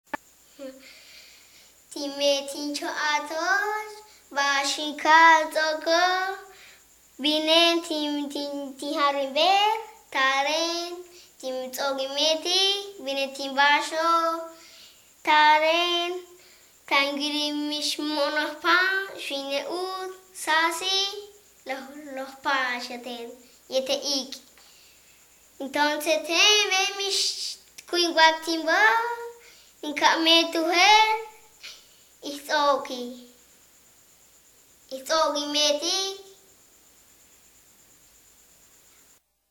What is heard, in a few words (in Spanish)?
lacandones canto del juguete